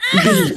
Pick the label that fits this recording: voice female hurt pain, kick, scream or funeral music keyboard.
voice female hurt pain